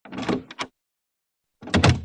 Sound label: door; CLANNAD FULL VOICE; Key